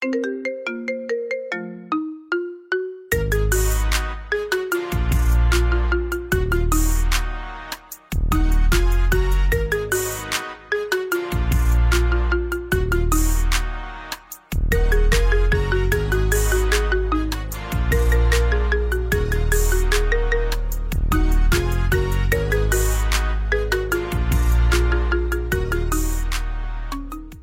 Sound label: Marimba Remix